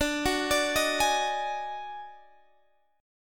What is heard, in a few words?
Eb Minor Major 11th D